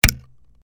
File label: can pull up